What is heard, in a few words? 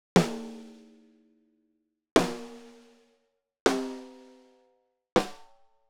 Snare Black Beauty